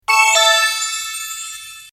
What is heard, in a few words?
ding dong, ringtones; tsumtsum; Disney